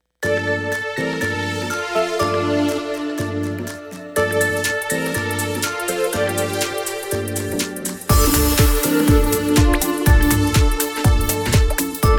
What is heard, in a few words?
Clean Organic